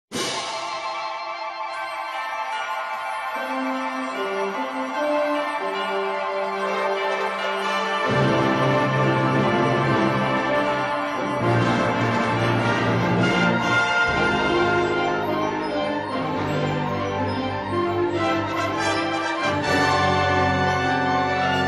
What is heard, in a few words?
Fanfare on Adeste Fidelis